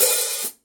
signature dark energy 14 dark energy hats mark i open